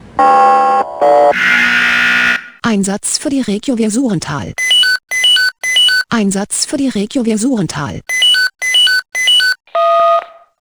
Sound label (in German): SMS Alarmierungstone; Suhrental